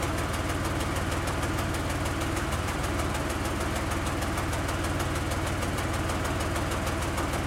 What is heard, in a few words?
load exterior engine loop, engines tanks; War Thunder